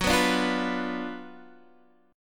Gb Major 7th Suspended 4th Sharp 5th, down, Guitar, Standard; strummed; JGuitar